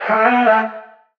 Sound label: vocal lights Am; SD AURORA Sample Pack; Slate